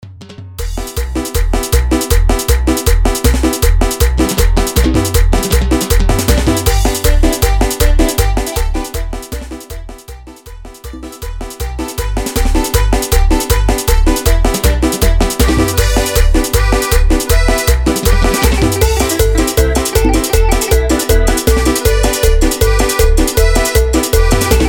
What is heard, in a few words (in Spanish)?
Instrumental